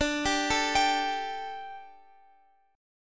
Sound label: D Suspended 4th D, Guitar, Open F; Dsus4; arpeggiated; x,x,14,14,12,14